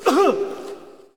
Hockey Male Hurt; SFX Kit; Hockey Vocal Sounds

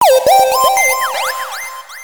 connected; Quentin C's Gameroom

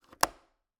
Cabin, window, latch, flip, lock, open, wide; Eiravaein Works; Vaeyan IV

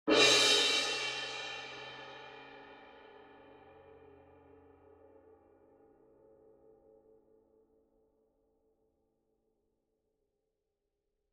CYMBAL Crash Hit Soft Beater Medium; boom library; Cinematic Strikes Construction Kit

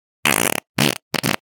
Fart Foley - Fart Combination - Big Deal; Hollywood Edge; sound designer's toolkit v2

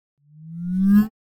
Button Tone Up Beep; Hollywood Edge; sound designer's toolkit v2

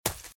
Footsteps - Leaves - Sprint - Left; RadwinIsland

